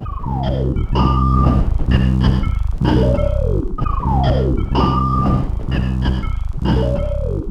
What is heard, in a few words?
C2, Synth Line; NiceBeats